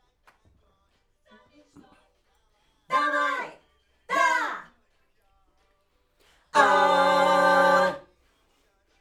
Backs AKG

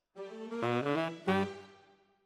Tenor, RNR for piano

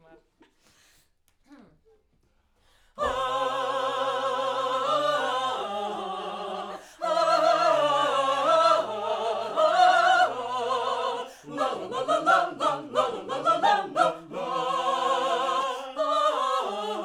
Backs; SCHOEPS

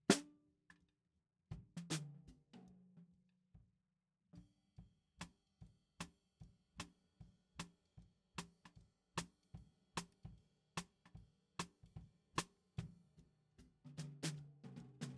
SNR BTM

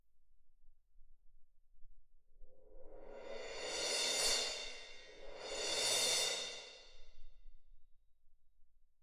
Perc

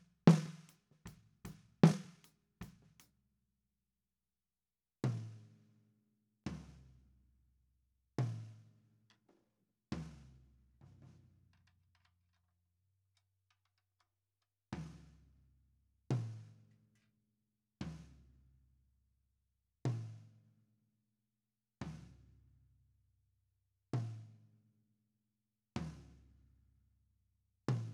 SNR

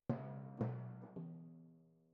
Timp Close

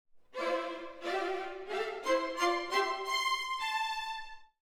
Orchestra, Jazz for piano

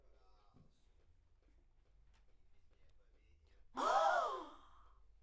Backs AKG LEFT

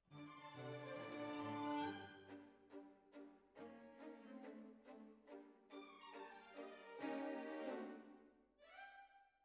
Flute

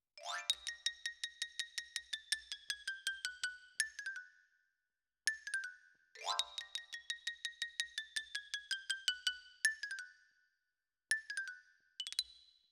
Xylo Close

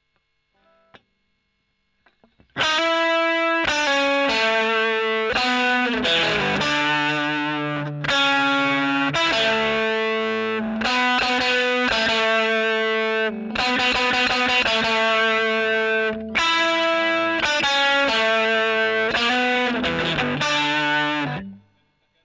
E.GTR OD; Gefell

